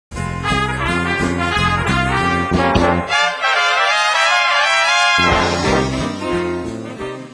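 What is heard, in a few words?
trumpets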